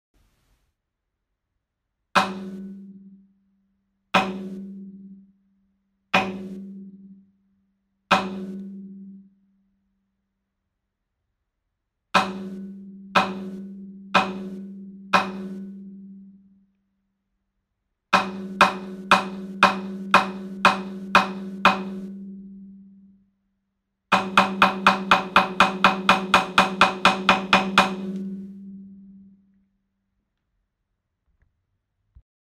taiko dou

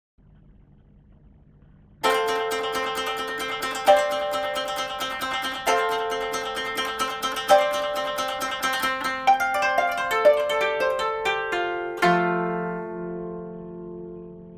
koto

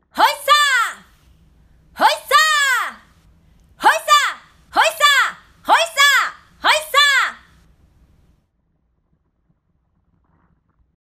voice hoisa

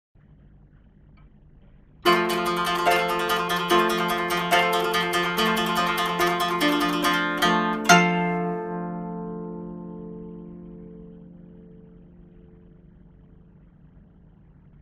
koto

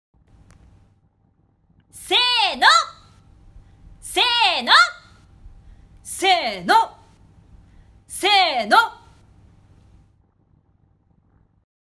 voice seeno

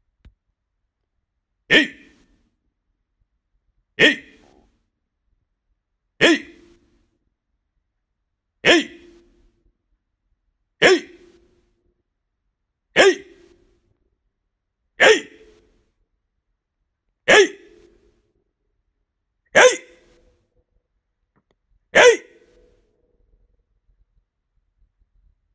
voice ei Low

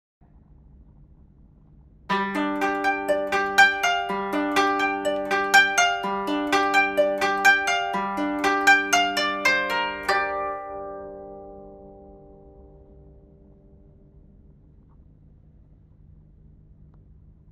koto

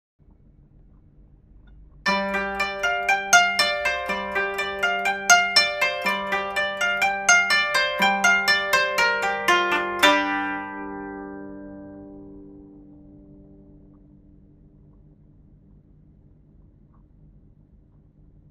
koto

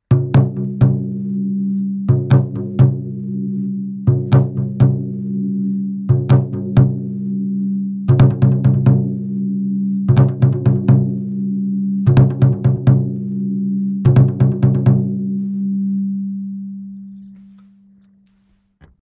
Taiko

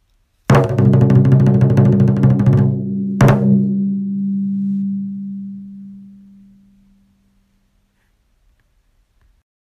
taiko

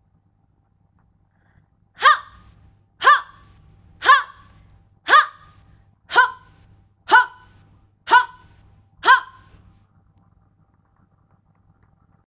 voice ha

